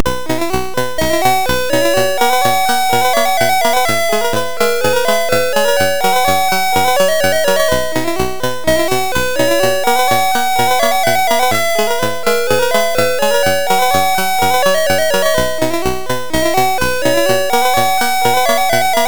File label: Victory, C64; Double Take